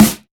trebly steel snare drum sound g sharp key, acoustic snare tuned to the G#